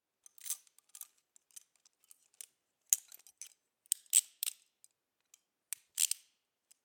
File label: Locking Handcuffs